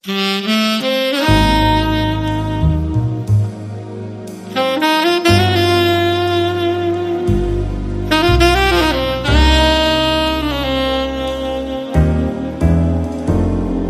Saxy Times

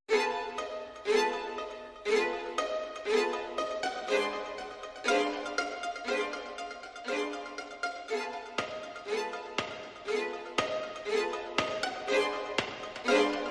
Aggro Strings, Underscore

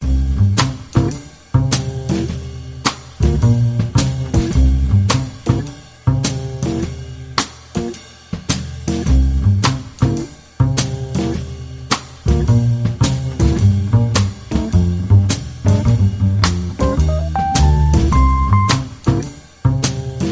Jazzy

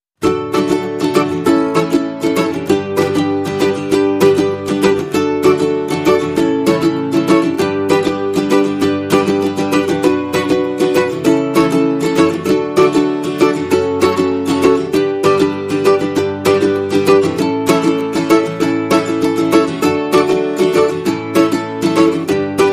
Ukulele and Guitar Mix

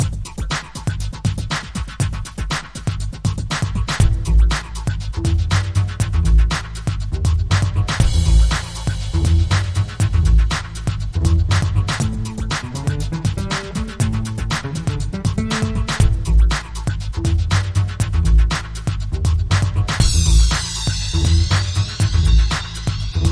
Swinging Bass

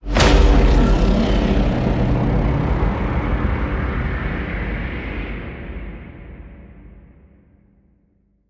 Hit Big Sonic Bomb

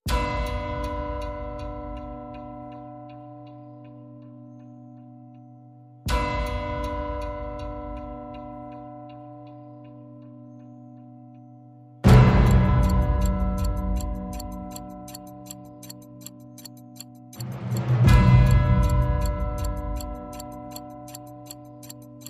Clocklike B

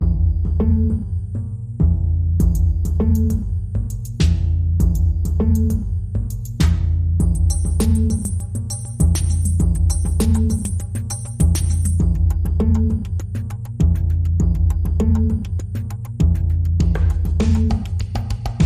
Bass

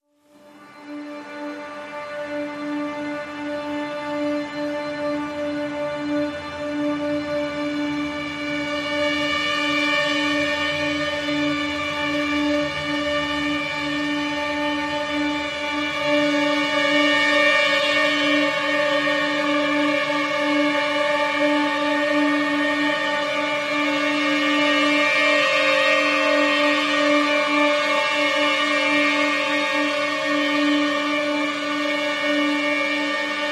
PADS Uneasy String (D)

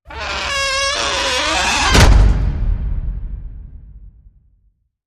Slow Door Slam

